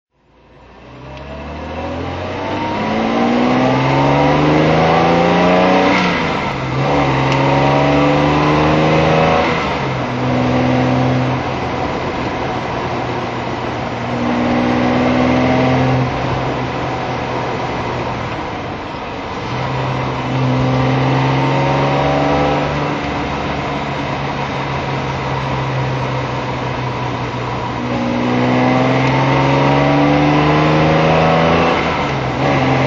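jaguar e type 38 fhc driving, Engine sound driving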